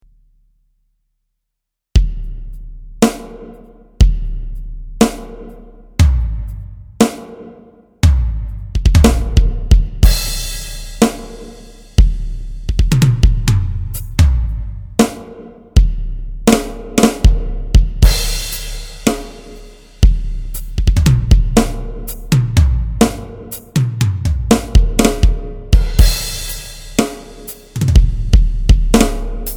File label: heavy slow groove